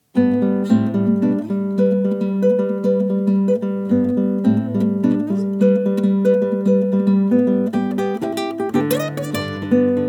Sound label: classical guitar